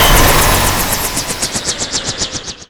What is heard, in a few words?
heli shutdown; Modern Warfare